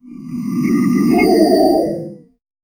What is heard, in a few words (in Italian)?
esplosione di uccisione, arcade